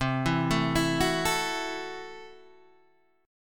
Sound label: C 6th Flat 5th, C, down, Guitar, DADGAD